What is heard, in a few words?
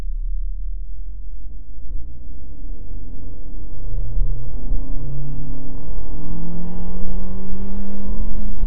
4 cylinder